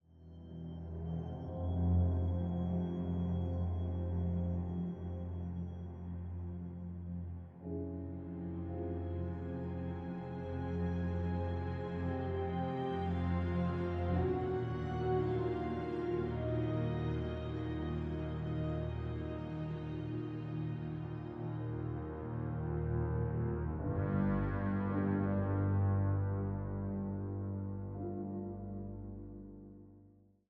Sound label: Subtle Threats, Thriller